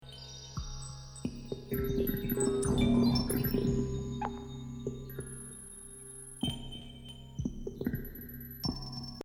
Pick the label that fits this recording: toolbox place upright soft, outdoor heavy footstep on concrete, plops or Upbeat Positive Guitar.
plops